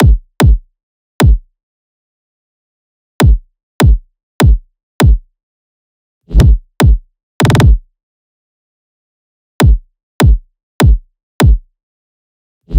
kick 150, Kick Loops; Drum Sample Pack; SD SEISMIC; Slate

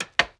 perc shut, Drums, One Shots; Slate; AURORA Sample Pack

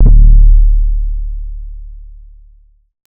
808 one shot D; Slate Digital BASSHOLE Sample Pack

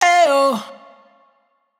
riff aye oh Bbm wet, Vocal; Slate Digital Omnivox Vocal Sample Pack

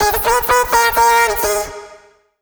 phrase Abm, Vocal; Slate Digital Omnivox Vocal Sample Pack

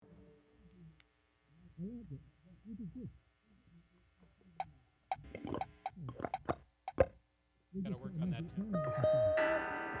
Strings Emphasis Mix

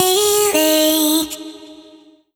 phrase Ebm, Vocal; Slate Digital Omnivox Vocal Sample Pack

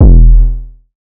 808 one shot E; Slate Digital BASSHOLE Sample Pack

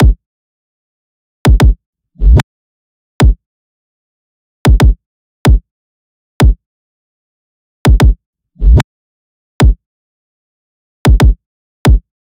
kick 150, Kick Loops; drum sample pack; Hierro; SEISMIC; Slate

